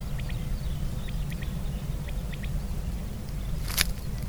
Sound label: Quail